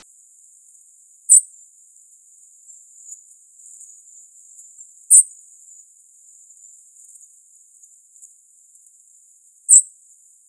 bruant chanteur, chant

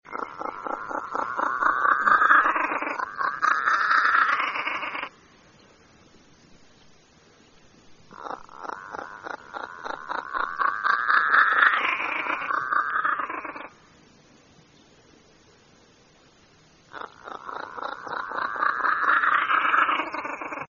corbeau pie, chant

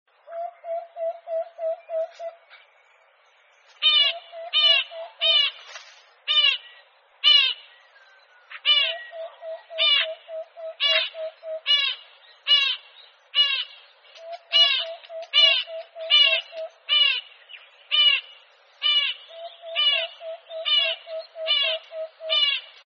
barbican pie, chant

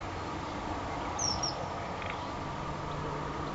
martin-pecheur d europe

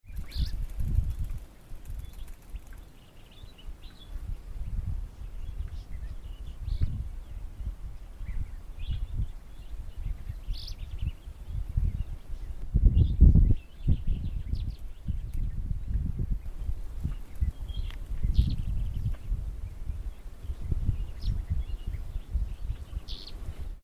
serin soufre, chant

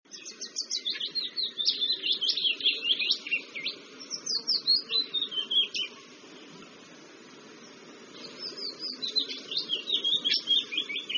pouillot fitis, chant

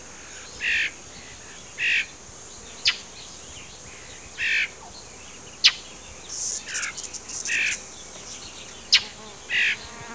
moqueur polyglotte

